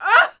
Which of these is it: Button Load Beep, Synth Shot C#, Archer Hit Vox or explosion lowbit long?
Archer Hit Vox